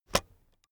keyboard external space release, computer

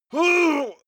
male groan strain; menschen; schreie

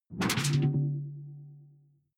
sci-fi alien tech noise; swooshes-swishes

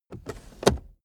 car accessories cup holder cover open fast; autos; verkehr transport

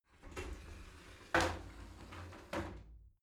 shower slide door open soft

